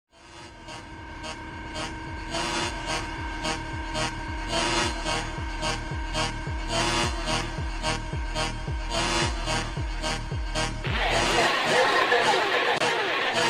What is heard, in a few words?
super slowed, reverb